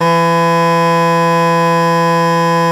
BASS SHAWM; SoundBytes - Early Patches

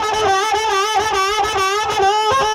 BLUES HARP, AMB SOUNDS

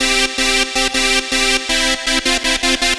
Dance Synths, Hardcore Loops, Synth Loops

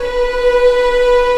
ANGELIC B3

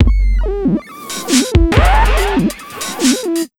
Big Beat Drumloops, SPEED 140

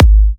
Kicks G#; markus hakala

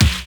RAIN BEAT; Univers Sons - Kitch 70s

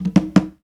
fill, Bembe fills; Bembe 6/8; Spectrasonics - Supreme Beats - World Dance

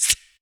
VOC DRUM, MOUTH; MOUTH PC

